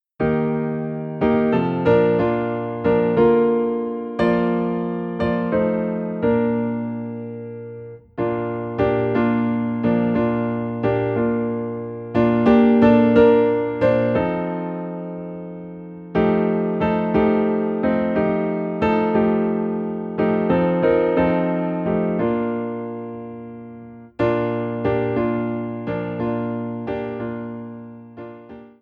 Piano in B Flat

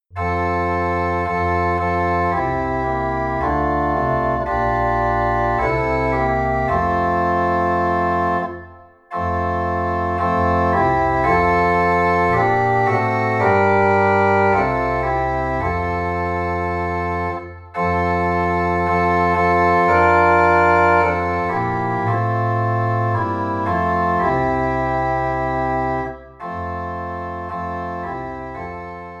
Organ in F

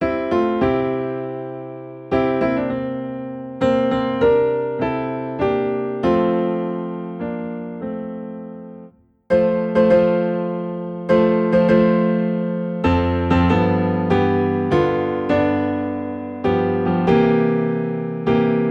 Piano in F

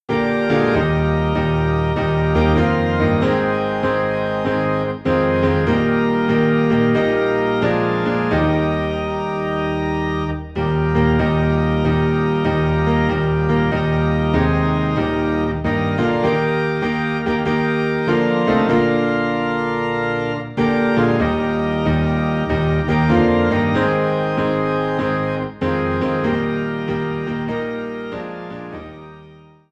piano and organ low in E flat; Are You Washed In the Blood

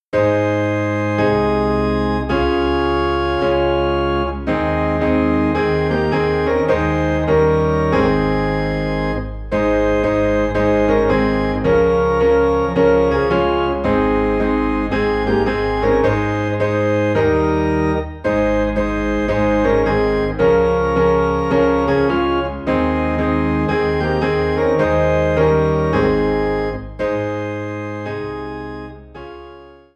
Piano and Organ in A Flat; Leaning On the Everlasting Arms

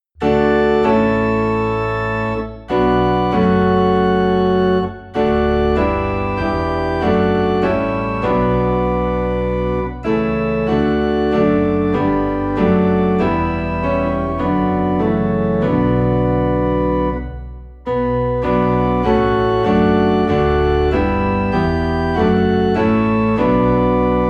Piano and Organ Low in B Minor; christmas; O Come O Come Emmanuel

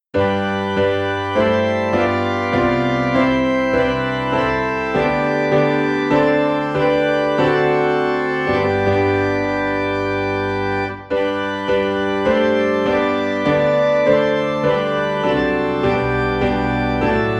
Piano and Organ in G; Joyful Joyful We Adore Thee

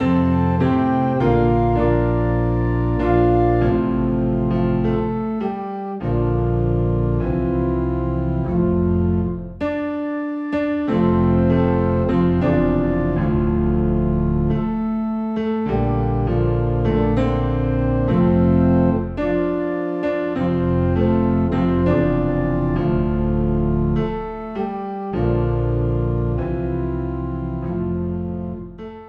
Piano and Organ Low in F

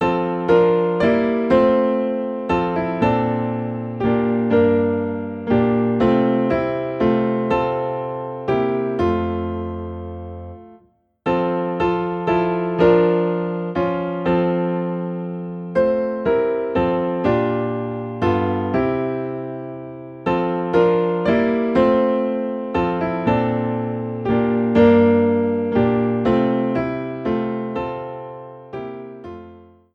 Piano in F; Breathe On Me Breath of God

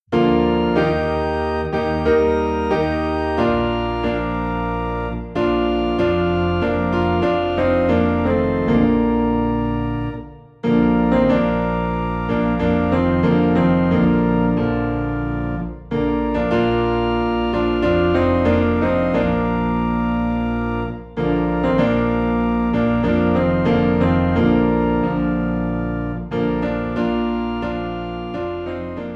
piano and organ low in B flat; O Day of Rest and Gladness

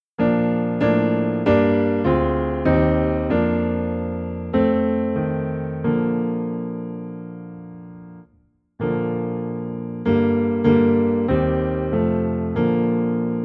Piano Low in B Flat

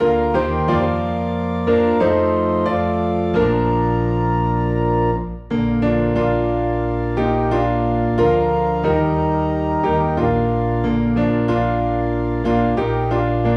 Piano and Organ in B Flat

